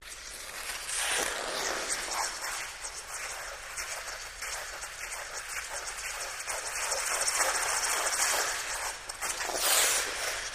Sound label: Ice Skating Spins